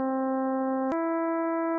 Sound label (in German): gr terz